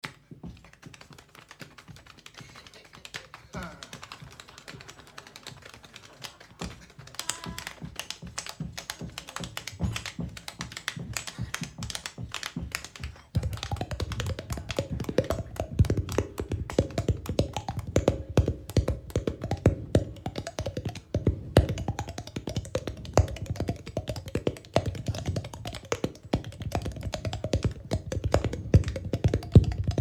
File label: Cheek Percussion